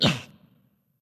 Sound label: hitreact large; smite; roman archer